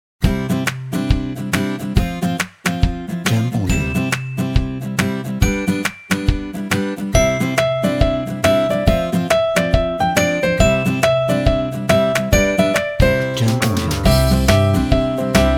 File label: Positive Corporate Optimistic